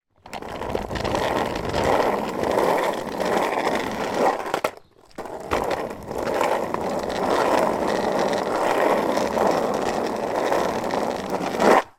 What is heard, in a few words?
sport skateboard skate 2 short bursts